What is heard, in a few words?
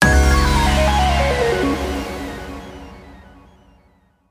JGL BATTLE START